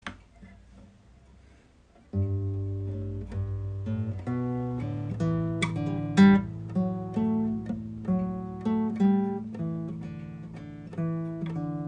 rhythm

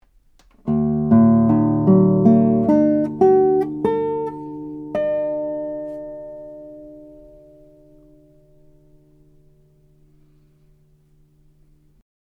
mic front

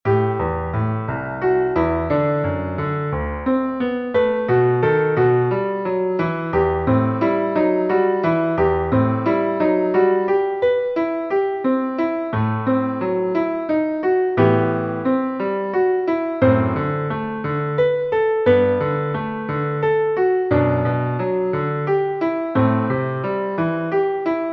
Reharmonization; Baden

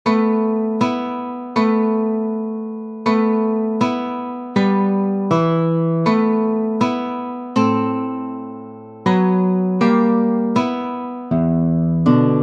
Harmonic Bad Etude

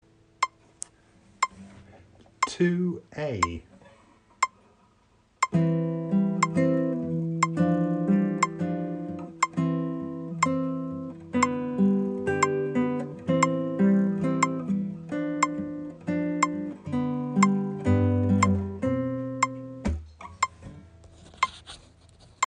Rhythm

